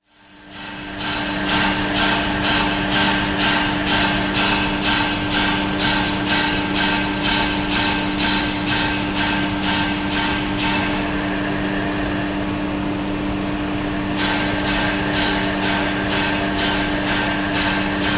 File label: SHIP CONTAINER